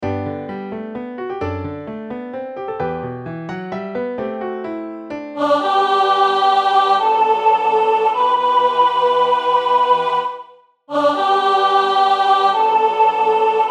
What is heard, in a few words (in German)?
Sopran